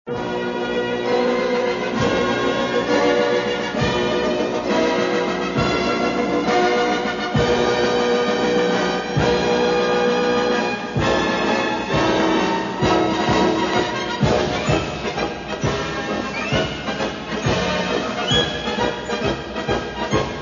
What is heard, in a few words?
Marsch; Starfighter